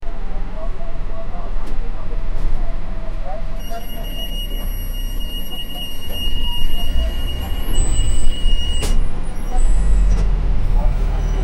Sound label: the signal of a bus, vehicles